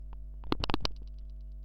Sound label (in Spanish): doble click